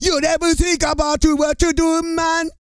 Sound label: REGGAE, Vocal Planet World Voices